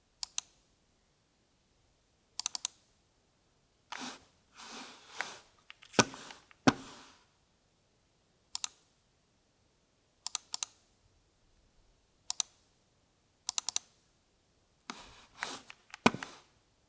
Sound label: Click Mouse Movements